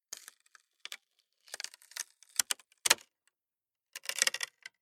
Splitting Wooden Crate With Hammer Crowbar Tearing Splintering Long; Tom Hutchings